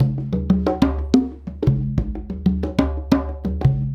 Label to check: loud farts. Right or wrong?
Wrong